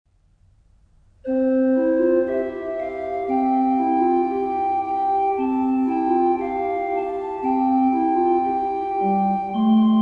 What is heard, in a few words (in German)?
orgelvorspiel; Hörbeispiel "Höchster, allmächtiger" GG 864